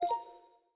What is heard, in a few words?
config net success